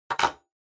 Pocket Watch Put

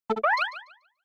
Single Accent Low

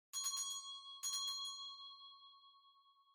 Winning Bell Far Gentle

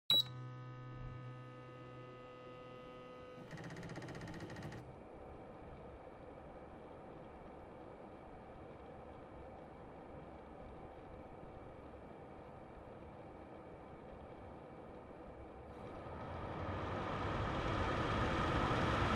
Air Purifier All

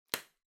Bubble Wrap (Pop Distant); 気泡緩衝材; プチッ 遠い; 梱包材

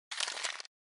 OS Sound Recycle

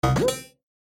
Motion Pop

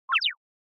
Anime Motion

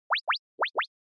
Anime Motion Phrase

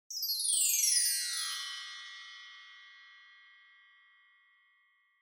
Wind Chimes Gentle Down Wide

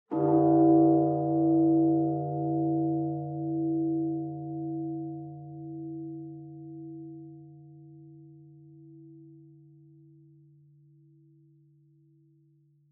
Bonsho Far High

